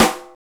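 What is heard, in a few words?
RINGER SNR, snare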